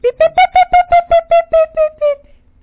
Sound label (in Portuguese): Chorando, chaves chorando no celular